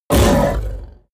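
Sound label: coloss snarl deep short, Creatures; kreaturen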